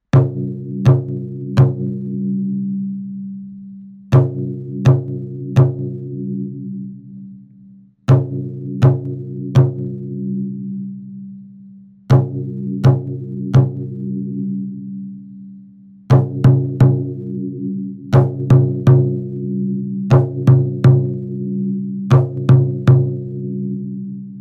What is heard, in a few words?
taiko